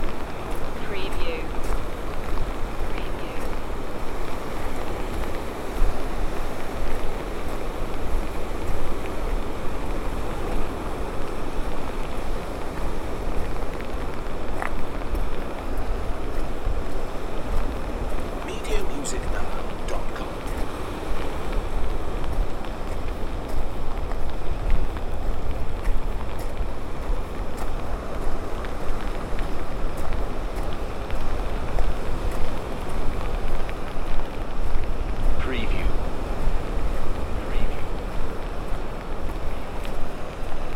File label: Rain on a hood walking, footsteps, pebbles, near the sea loop; beanstalk audio